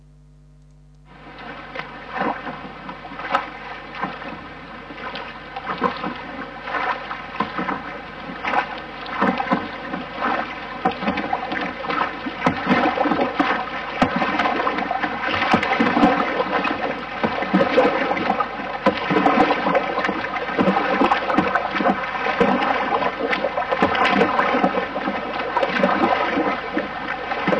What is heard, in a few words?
boat row